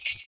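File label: PERC, percussions; Univers Sons - Raricussions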